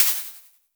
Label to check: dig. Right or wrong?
Wrong